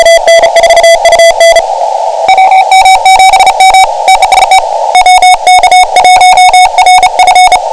7MHz

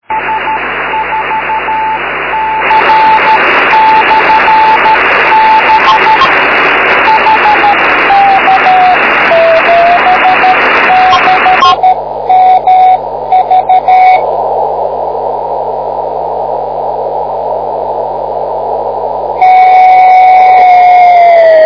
beacon

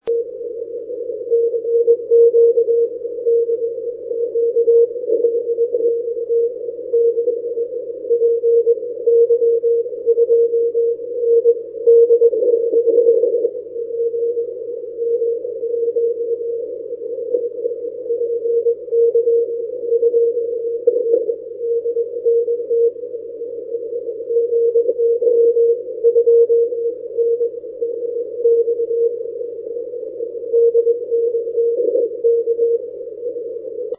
80m cw, Rafeal from Brazil coming in well.; recorded at VE6WZ directly from the FT-1000d

